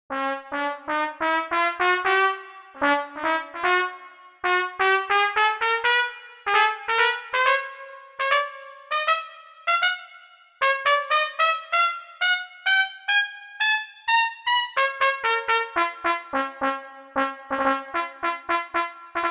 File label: Trumpet